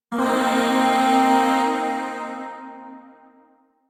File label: Shawzin Blues Chord L; Chords; Warframe; Zariman; Void